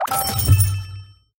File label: purchase; killer io